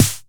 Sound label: 909 SN; Northstar - New Gold VOL-1; TR909+ZAP